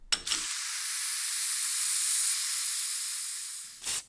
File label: refill; outfly